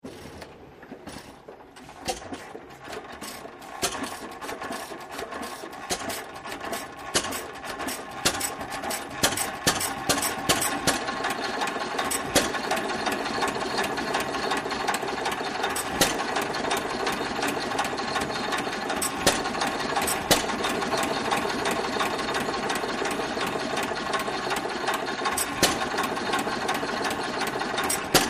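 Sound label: Diesel Drill Mechanical, drills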